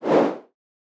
air woosh; soundscrate

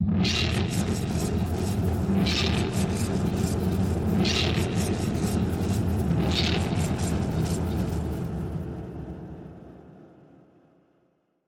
distorted chaos; SoundsCrate

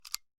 Shotgun Loading; SoundsCrate

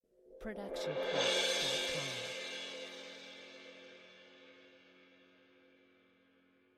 ride cymbal 20 inch swell mallets short; soundscrate

